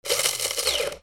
KITCHEN

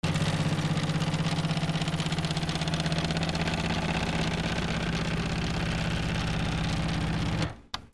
OFFICE

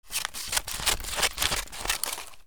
PAPER

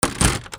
DOORS

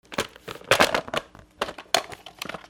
HOUSE

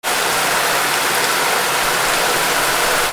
BATHROOM

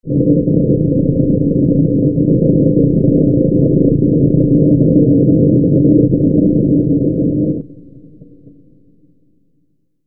SCIFI

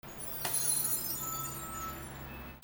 VEHICLES

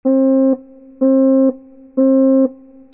ALARMS

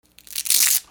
RIPPING

